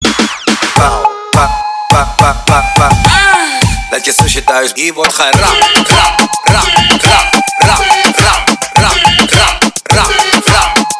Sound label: Party Breakz Reggaeton